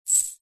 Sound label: animals dog collar chain place into persons hand pass over